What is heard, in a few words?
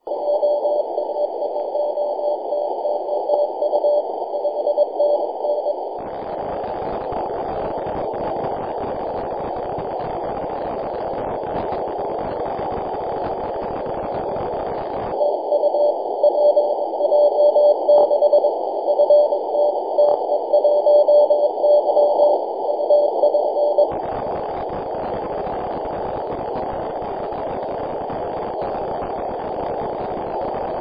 14.054mhz cw; pj6 nm1y